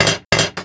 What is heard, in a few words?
grenade hit